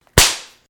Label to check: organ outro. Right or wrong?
Wrong